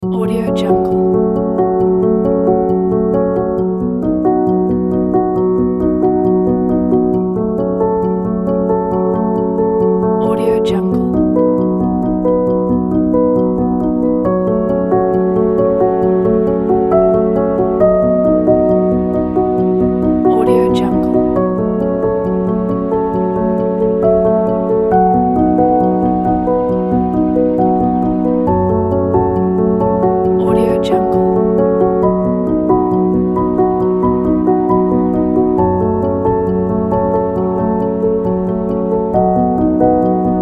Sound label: documentary